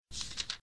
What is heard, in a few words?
turn